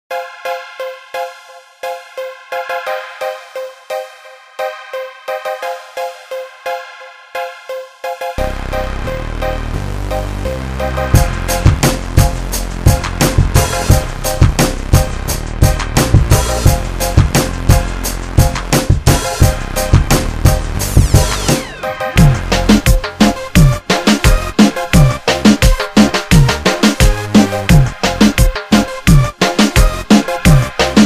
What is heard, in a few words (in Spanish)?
Reggaeton Gangsta Pista